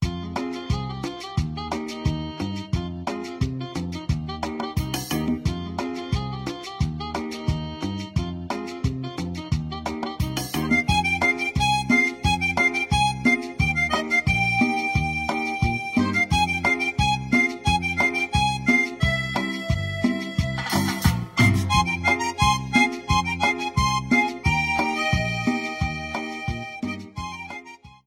Cumbia; ritmos

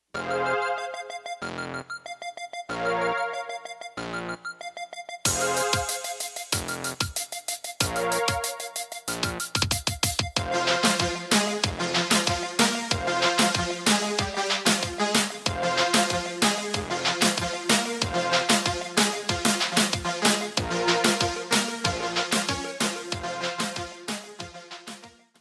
Reggaeton, ritmos

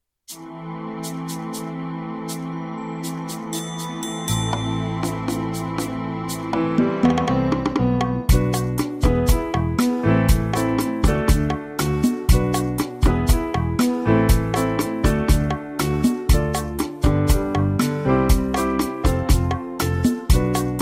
Balada Latin, latino; ritmos

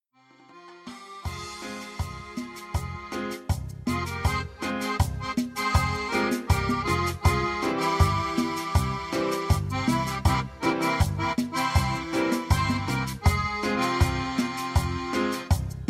Sonidero, cumbia, ritmos

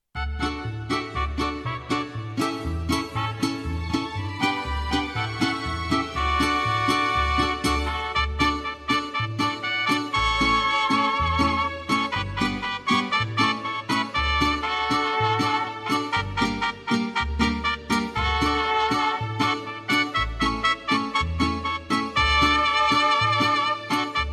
Mariachi

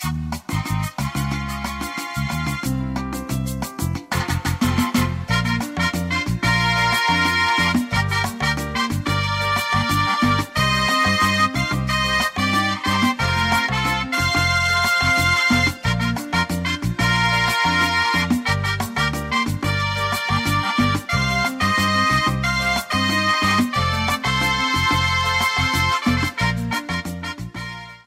Salsa Matancera

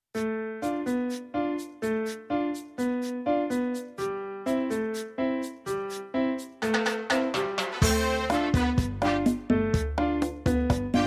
Cha cha cha

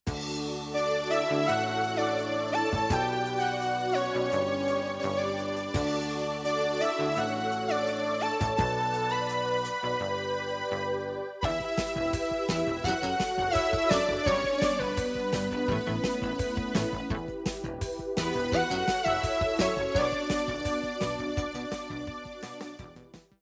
Pop 80s, ritmos

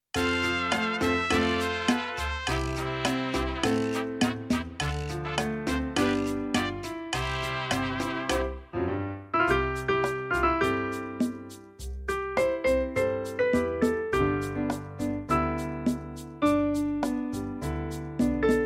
Santanero, ritmos, tropical

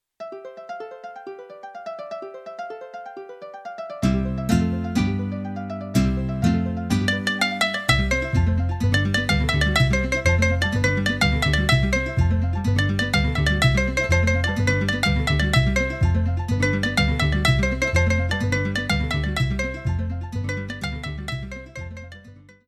Bamba, mariachi

